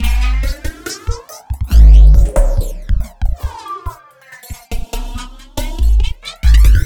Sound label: 808 Loop; Sonic Foundry (Sony Creative Software); Esoterik Beatz